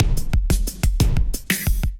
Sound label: Big Sun Kit, Drums; Ableton; Drive and Glow